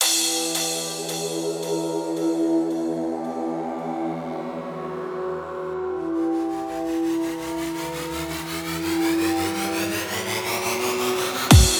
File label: MONSTER BASS; PROWAVESTUDIO